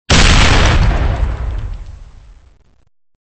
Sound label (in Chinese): 微型爆炸; LaboratoryProtection